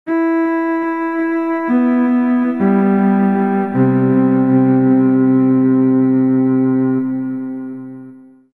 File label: spacey cool viola